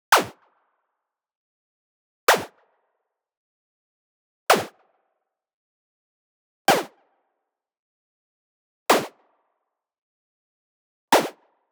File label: LASER Shot Short; Anime Essentials